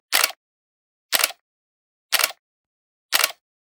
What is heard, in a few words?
Generic Click Metal Medium; boom library; The Interface